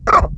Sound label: eagle sound, Baboon